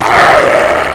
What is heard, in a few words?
wolf growl; were